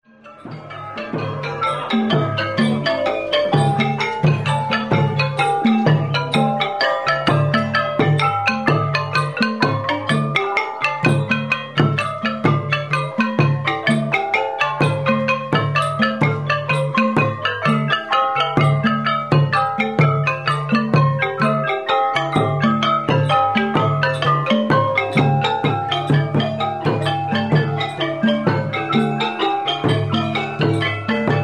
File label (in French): cambodge concert rue